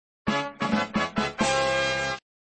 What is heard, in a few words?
Music Fanfare, flipper; Indy